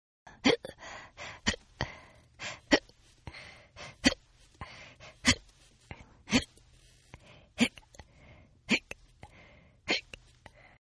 Hiccup Female; Hiccup Female 5; Hiccupping Sound Effects; Human

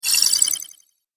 Fantasy Spell, magic sound effect

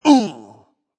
Vocal Deep Grunt

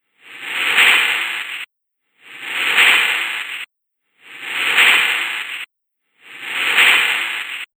Grainy Magic Motion Loop; Looping; Andrew Fleming

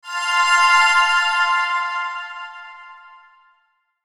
SHIMMER RING; Shimmer Ring Reverb Wash; High Tone; Blastwave

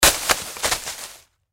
Impact Leaves Object Drop On Foliage; Impact Leaves Object Drop On Roll Foliage; Rustling (Sound Effects:Objects); Blastwave

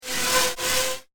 Saw Blades Of Horror, scary soundscape; horror sound effect

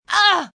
Grunt Ah, Grunts, groans, impact, female, pain; Groaning; VoiceBox

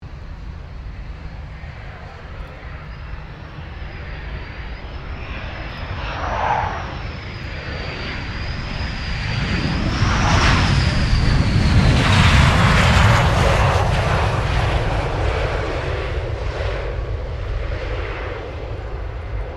2006 FA-18 HORNET LAND; RAAF FA-18 Hornet; Airplanes; Vehicles

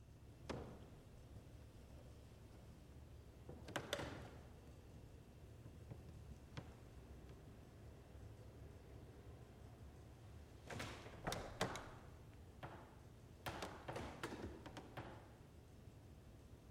Creaks Wood Church Pews Very Subtle; Ambient; Creaking; Big Room Sound

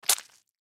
Human Zit Pop, Zit Pop Squish Squeeze; Blastwave

